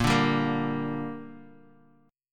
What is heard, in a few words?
A sharp 6th, Guitar; A#6; strummed; 6,5,5,3,x,x